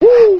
"woo"

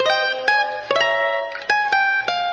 guitar

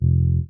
muss, Bass

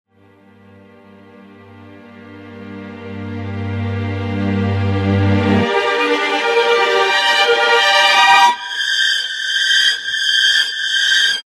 strings build to influx

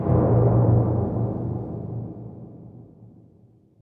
boil, Misc Percussion Tympany

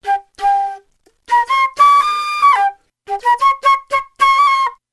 flute sweetie, Loops, Jazzmatazz

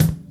Kicks Loud

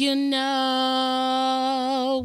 you know sung

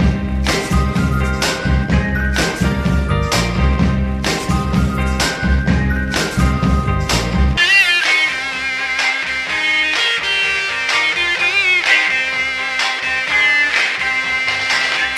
full, Loops Phonky

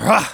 growl, Voice

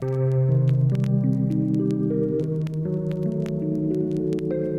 keys, jazz loops

